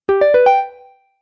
Game Bonus Ascending Strum; Video Game Sound Effects

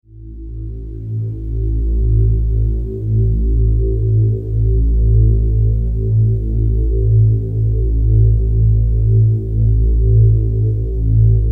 Spaceship, Spaceship: Ambience; Spacecrafts; Stuart Duffield

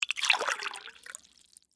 Water Pour Chalice, Into, Metal, Cup; Pouring; BlastWave

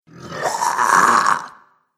Zombie - Mostly Armless; Zomby Sound Effects; horror

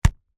Sparring Glove, Impact, Hit, Punch; Sports; Solid; Boxing Sound Effects

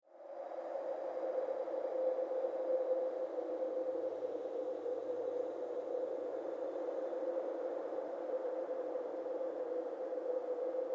Horror Strange Wind; Scary Soundscape Sound Effects; Scary Soundscapes (Sound Effects:Horror)

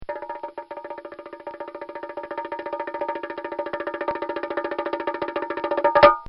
Bongos

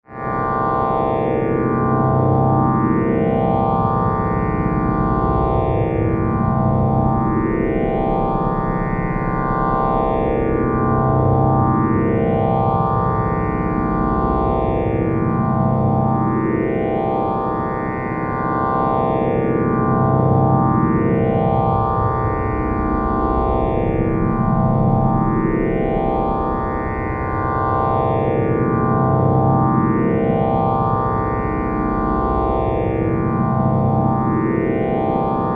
Force, Field, Energy, Source, Scifi; BlastWave

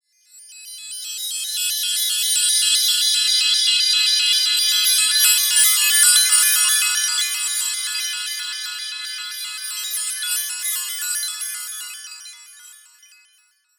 Sweeper Chimes Warble; Chime Sound Effects; Production Element Imaging Element Accent Transition

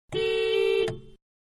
Car Small-Horn-Medium, Interior; Cars (Sound Effects:Vehicles)

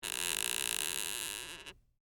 Door Squeak Wood, Foley, Door, Squeak, Wood; Garage Door Sound Effects; BlastWave

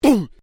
Male Vocalization Hun; Male vocal sounds; Exclamations; Voice

